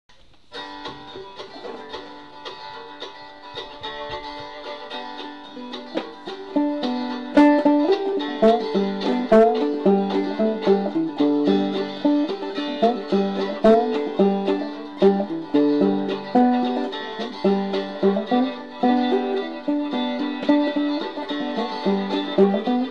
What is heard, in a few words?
fretless, banjo